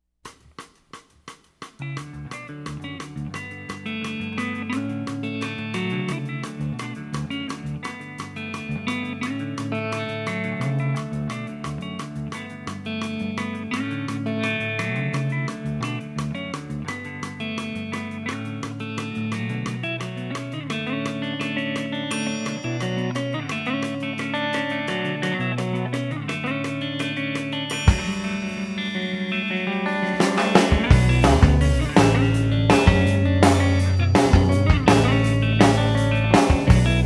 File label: Math Rock with Vocals; Mixes for Playing In VRChat Worlds